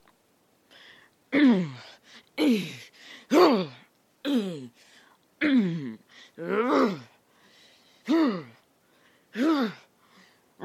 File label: Painful Grunts Boy